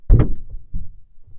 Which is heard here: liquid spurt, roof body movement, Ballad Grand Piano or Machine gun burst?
roof body movement